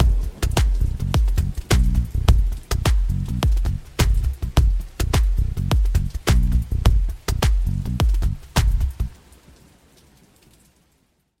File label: cajon 6 8; bastaki; percussion loop